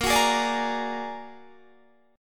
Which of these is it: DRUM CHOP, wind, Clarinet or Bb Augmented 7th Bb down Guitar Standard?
Bb Augmented 7th Bb down Guitar Standard